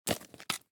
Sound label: take off mask